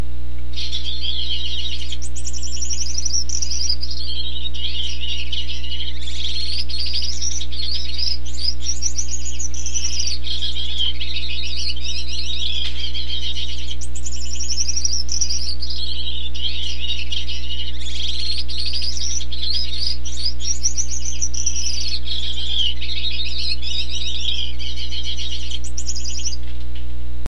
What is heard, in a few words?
gould singing